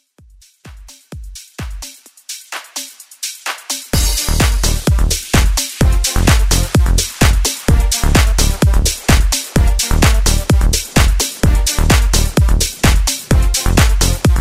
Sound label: Club House Edit